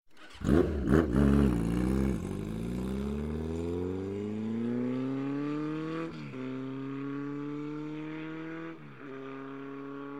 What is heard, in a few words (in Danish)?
Motorcykel let koerer vaek; KØRETØJER